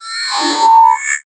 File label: ai powered robot